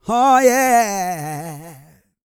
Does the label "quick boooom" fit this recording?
No